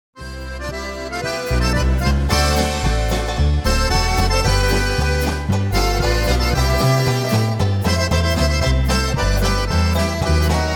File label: Polka